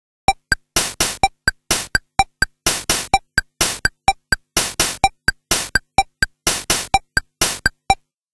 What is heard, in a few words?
CASIO VL-1 ROCK